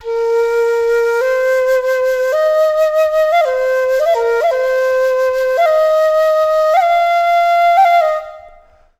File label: FLUTE ETHNO 125